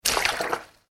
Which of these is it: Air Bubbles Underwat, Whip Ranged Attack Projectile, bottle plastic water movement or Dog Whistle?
bottle plastic water movement